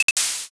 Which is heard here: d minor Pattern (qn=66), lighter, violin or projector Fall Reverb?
lighter